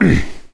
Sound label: melee swing; survivor; gambler